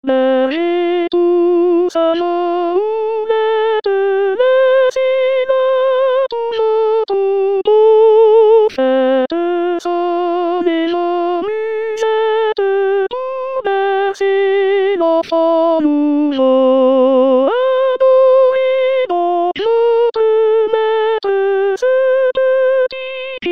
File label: Ecoutez la voix des anges Soprano; Chorale